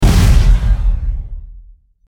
Explosion

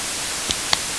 clap noise; claps

